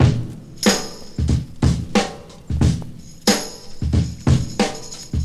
92 bpm drum groove B key, Free breakbeat sample - kick tuned to the B note. Loudest frequency: 1448Hz; drum loops